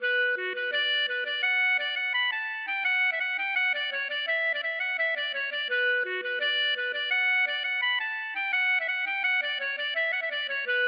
bourree

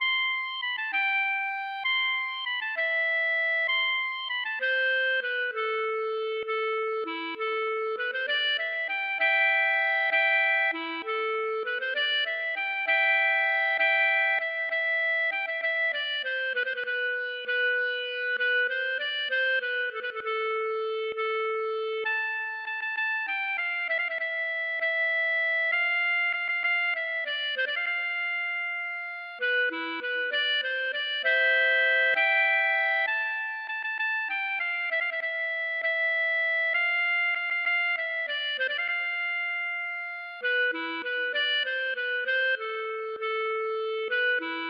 valse

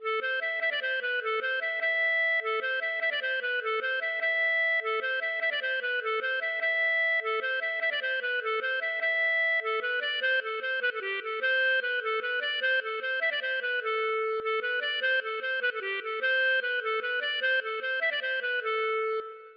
bourree; Prends Garde Au Loup

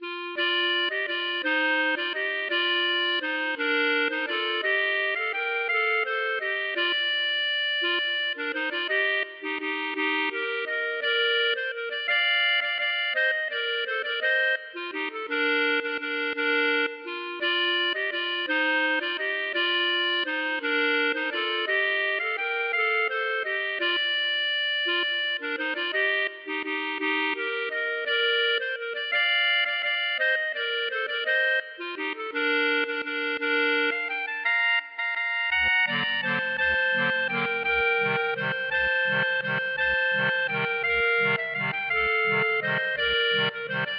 valse

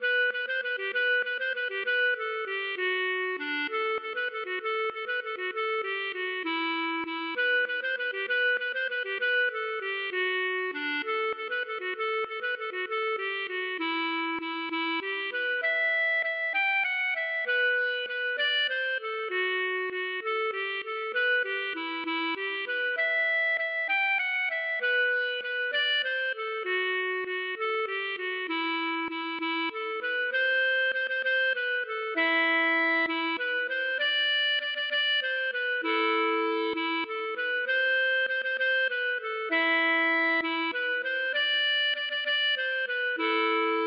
valse

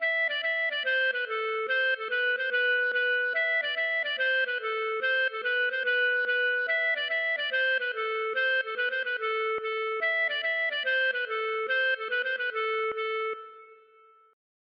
gavotte; Gavotte ton kentan A

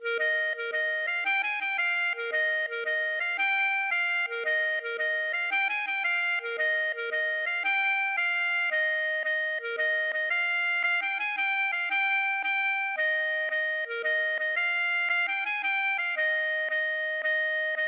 gavotte Bas Leon Du Conquet

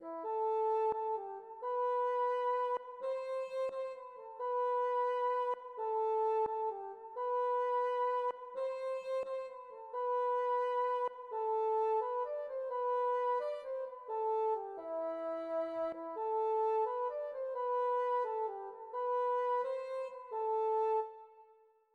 chant Breton; An Hini + Karantez + Me Zo Ganet

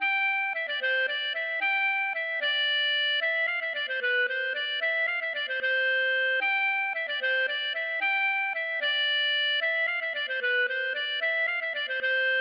valse

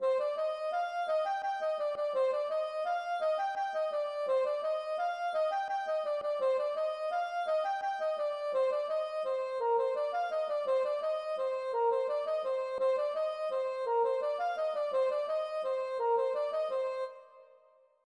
ridee

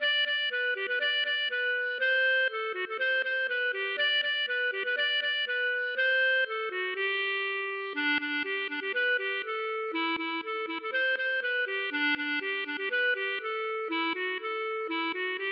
aeroplane; SaintPeran

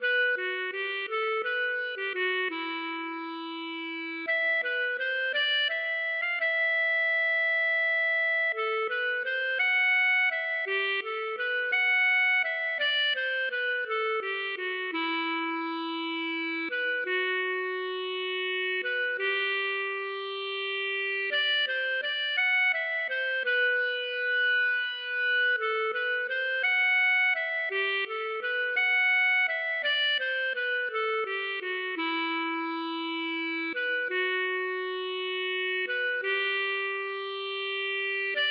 valse

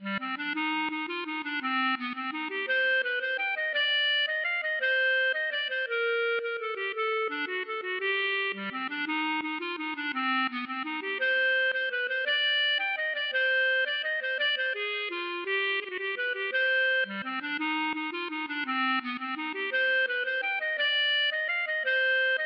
valse

